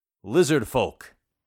lizardfolk; bestiary; 5etools

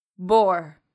boar; bestiary; 5etools

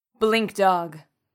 blink dog; bestiary; 5etools

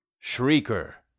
shrieker; bestiary; 5etools

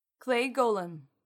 clay golem; bestiary; 5etools

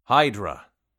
hydra; bestiary; 5etools

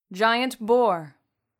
giant boar; bestiary; 5etools

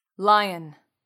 lion; bestiary; 5etools

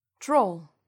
troll; bestiary; 5etools

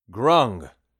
grung; bestiary; 5etools

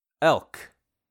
elk; bestiary; 5etools

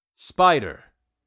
spider; bestiary; 5etools

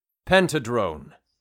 pentadrone; bestiary; 5etools

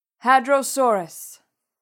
hadrosaurus; bestiary; 5etools

